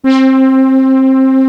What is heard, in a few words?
CS-80 BRASS; East West - Global Sounds